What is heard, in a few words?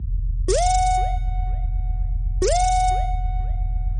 nightcrawler instrumental